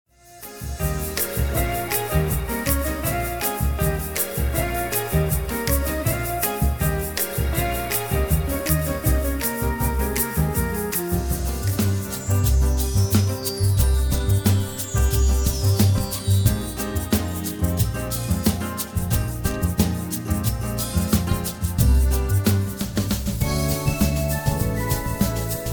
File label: bossanova